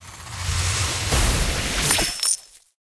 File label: chest feature; goldentavern